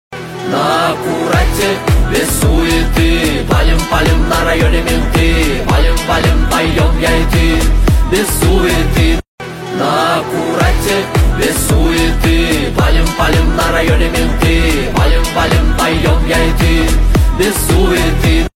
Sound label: techno